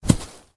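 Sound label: Body Falls gravel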